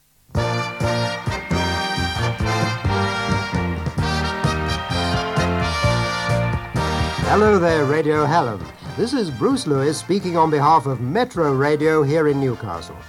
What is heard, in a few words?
Jingle; Hellam OS Jingle; Metro Radio Welcome